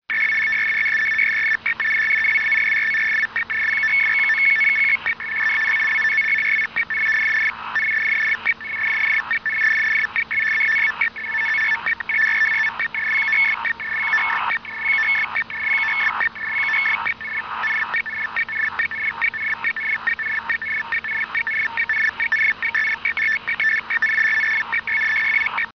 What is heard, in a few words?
swed arq, digital modes